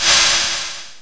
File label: Brush, Roland R-8